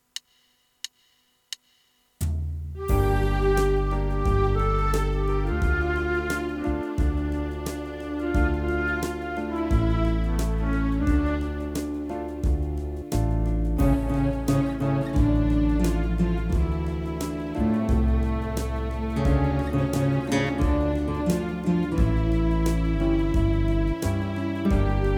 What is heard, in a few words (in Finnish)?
tausta instrumental